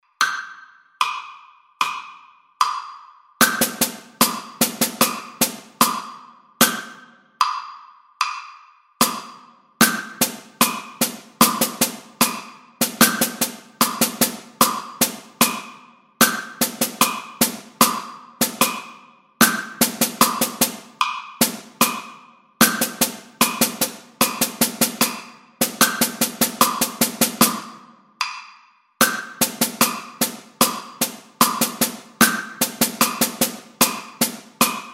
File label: drum set